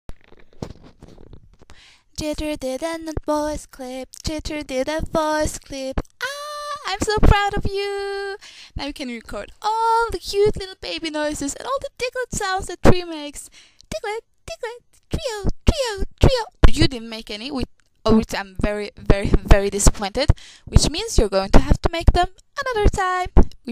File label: tihi voice clip